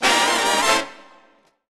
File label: Swanky Music